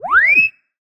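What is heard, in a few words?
Sci-Fi Droid Robot Emote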